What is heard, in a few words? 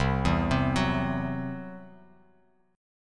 C-Major Flat 5th, Bass Standard; arpeggiated